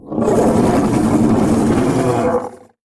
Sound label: Grounder Harpooned; Evolve; Kraken